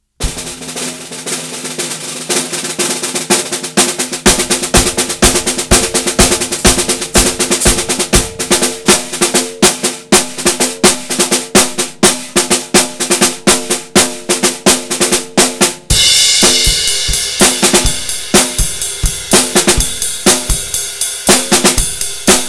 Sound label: gold snare; CraftDrums